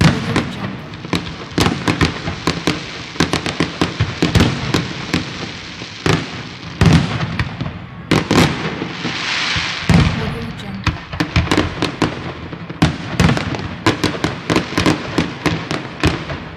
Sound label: the firework; fx library